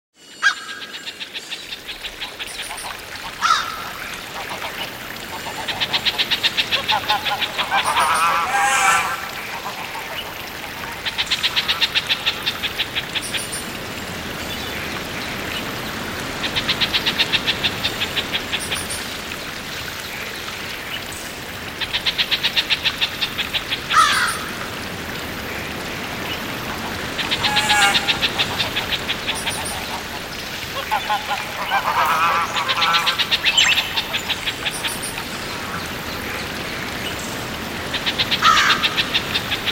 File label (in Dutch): Bosgeluiden